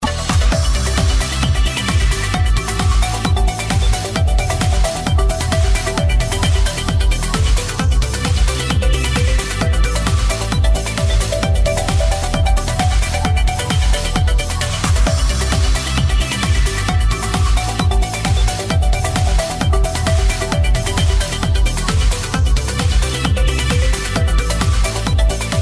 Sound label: Future Trance